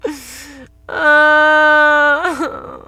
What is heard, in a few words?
sadness